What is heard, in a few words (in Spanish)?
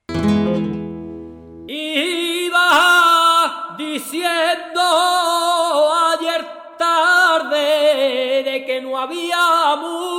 fandango natural